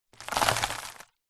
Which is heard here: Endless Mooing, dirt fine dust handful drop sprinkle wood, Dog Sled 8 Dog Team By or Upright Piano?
dirt fine dust handful drop sprinkle wood